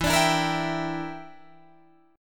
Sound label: E Minor 7th Flat 5th E, Guitar Drop D